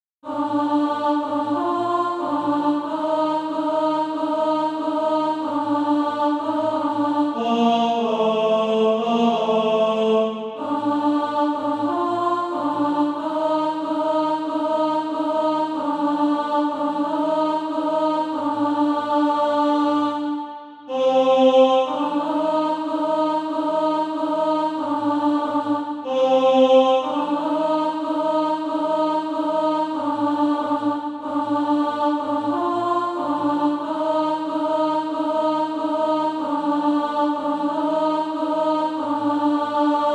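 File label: alto, SATB Practise Music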